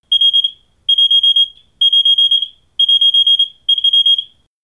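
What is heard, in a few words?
Alarm Electronic Beep; hi-tech-various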